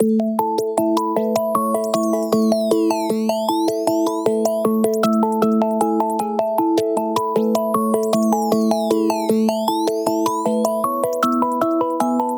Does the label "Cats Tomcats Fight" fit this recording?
No